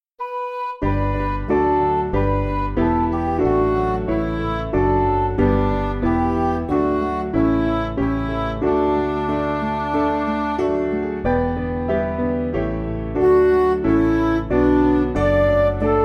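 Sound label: Music Backing Files for Churches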